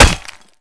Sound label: Projectile Sounds; Red Orchestra Ostfront 41-45